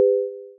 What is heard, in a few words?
500 Hz plus 400 Hz - 300 ms 100 beats per second; (118% of Critical Bandwidth)